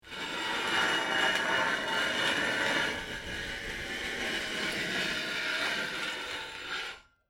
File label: Metal Slide Rock Grinding Against Smooth Metal; Metal Sound Effects; Lynne Music